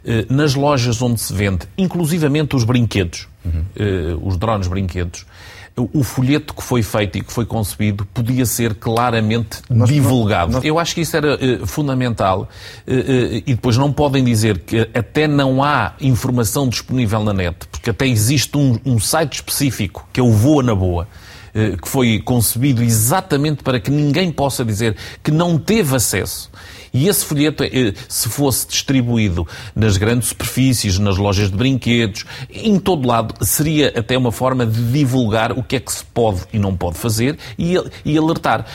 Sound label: Drone